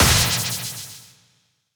launcher

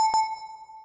get air

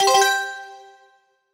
ui pause

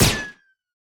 blaster

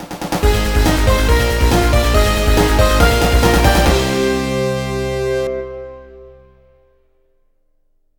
level clear

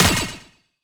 item shield hit

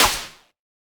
pounce

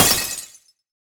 glassbreak

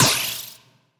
item box

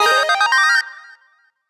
gachapon Win

